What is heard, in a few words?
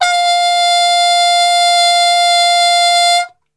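F 3 trumpet cup mute; Best Service; Brass Super Section; TRP CUP MUTE; 90 s Sample CDs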